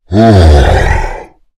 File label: balrog attack; spawners mobs